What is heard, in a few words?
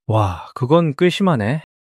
surprise